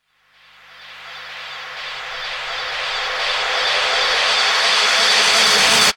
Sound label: FX Uplifter